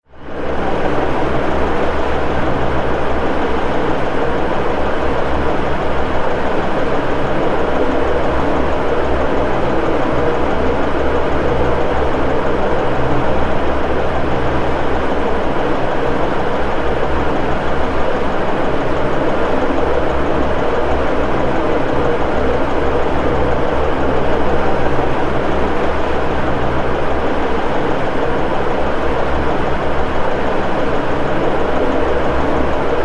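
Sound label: Engine Room Background; Engine Room 2; industrial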